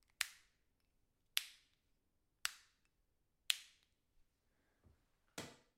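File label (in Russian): переключатель электрической зубной щетки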